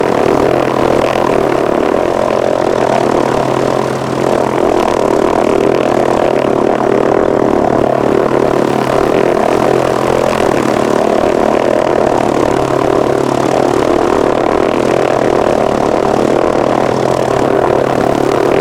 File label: bomber propeller; planes; gmod; lvs planes